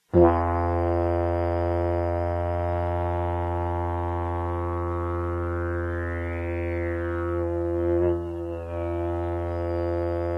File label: Didge